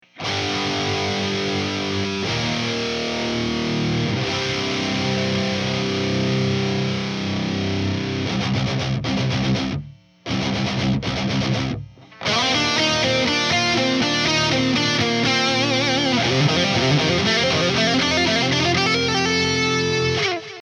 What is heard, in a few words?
amp